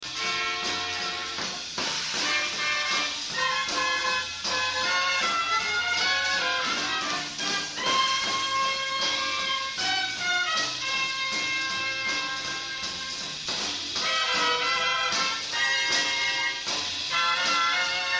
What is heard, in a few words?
Hot Trumpets rep fragment